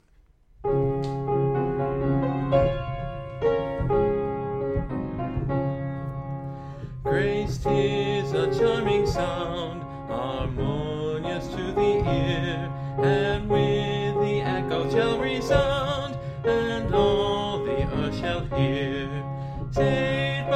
Grace! 'Tis a Charming Sound, singing through the hymnbook; Great Hymns of the Faith